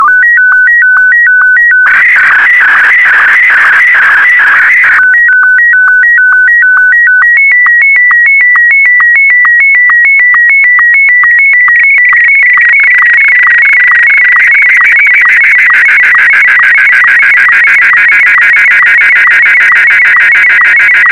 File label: sstv; VK4MSL